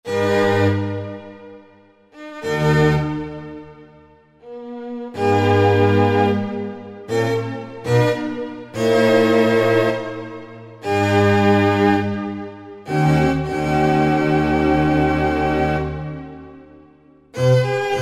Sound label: Chamber Strings, Korg TR-Rack